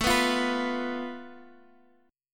A Suspended 2nd Sharp 5th A Guitar Standard, chord; Asus2#5; strummed; x,12,9,10,12,x; JGuitar